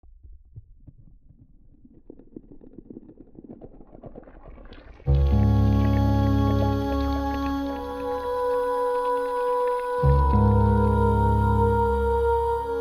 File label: 555 Hz